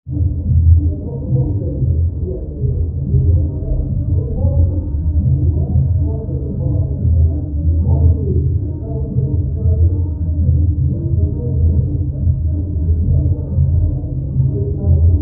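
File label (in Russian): у соседей сверху шумная вечеринка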